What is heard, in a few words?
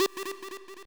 sub lead g3